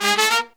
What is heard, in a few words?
Pop & Funk Brass, 096